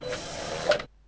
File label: Airlock Close; Siemens SL65; ProSlide